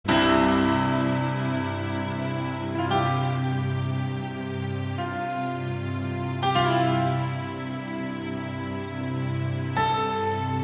Acoustic Rock

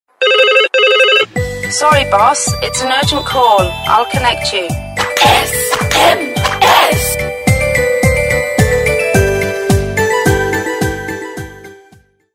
Sms Chinese New Year

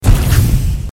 Cyber Stomp

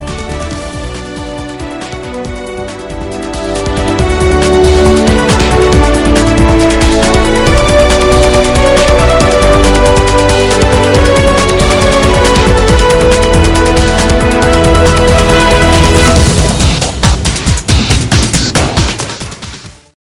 Orchestra; Mgs

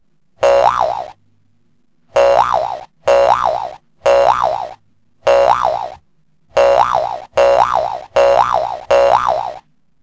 Rubber Band

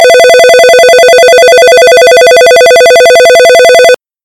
Nortel Long Ring

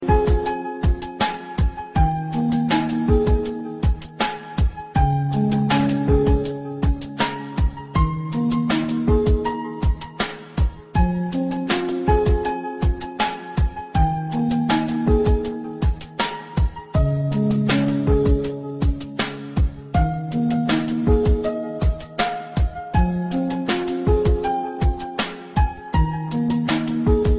Beat Bell

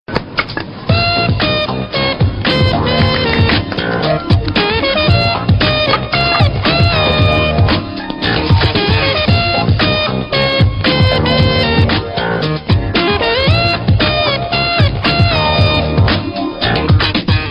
Cricket Theme

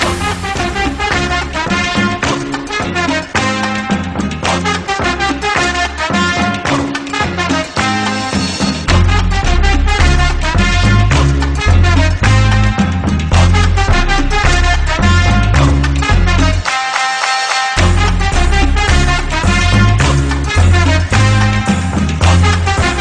Riddim Instrumental

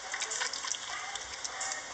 Lemur drinking

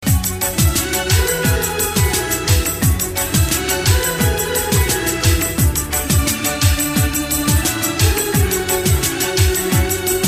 Sweet Msg Tone

